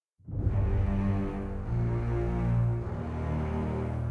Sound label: combat defend mountain intro; opal